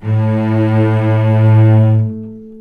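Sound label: cellos attack A2, String; arco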